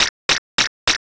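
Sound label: footsteps sand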